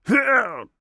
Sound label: Grunt Slow; Warrior; Hi-Tek Trooper; Realm Royale